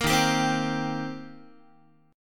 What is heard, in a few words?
D sharp 6th, D sharp, down, Guitar; strummed; JGuitar